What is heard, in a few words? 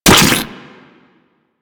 Venom Tick; Warframe; Saryn